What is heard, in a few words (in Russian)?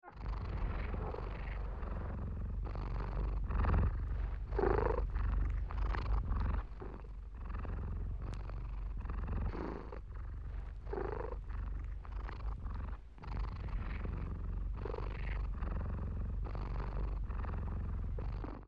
koshka s kotjatami murlychet